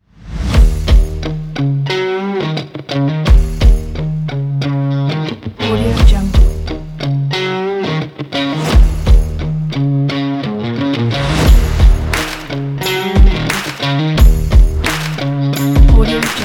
action sport rock pack

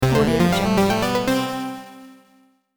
chiptune victory

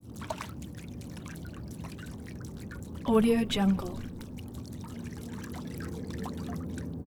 water fin movement

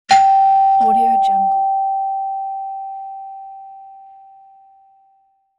doorbell

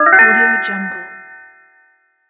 achivement

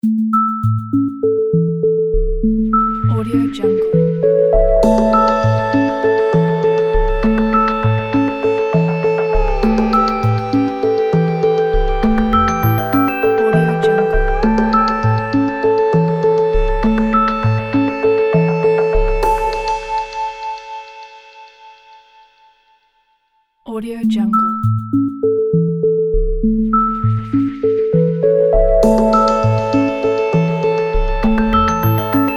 gentle electric logo

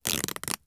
cloth rip halting

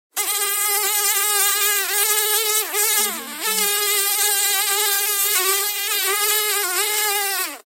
fly; mantlee

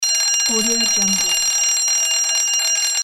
old fire bell

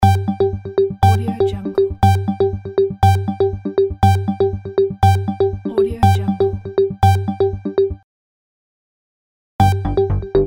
tv countdown

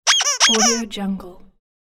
squeaky toy

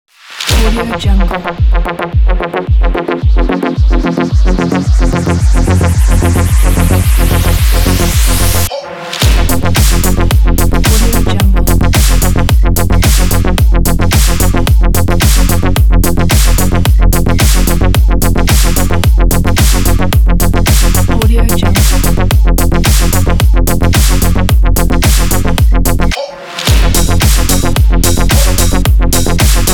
cyberpunk modern

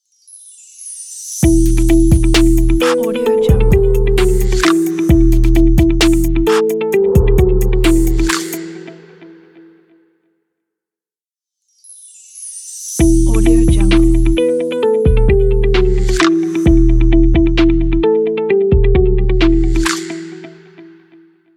modern brand logo

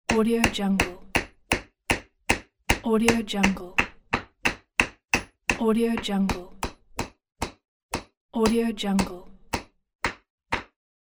hammer

